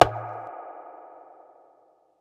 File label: conga; eddyflux